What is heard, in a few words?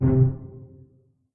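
pizzicato C2 pianissimo left, string; Sound On Sound - Peter Siedleczek's Orchestra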